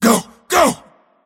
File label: move pain; dota; mars